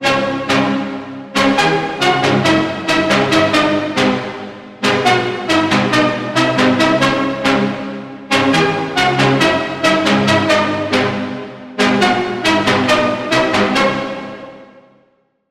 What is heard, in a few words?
Lead; The Deal; Routed Sound; Vital Expansion - Noble Gangster Vol.1